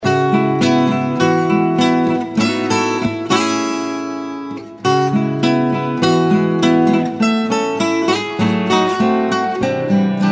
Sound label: Guitar Rhythms